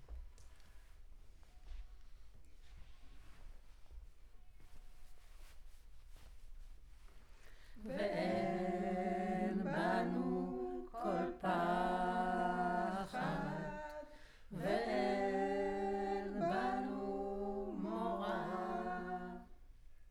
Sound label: Center u47, Choir